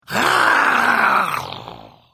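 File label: Attack, Khajiit female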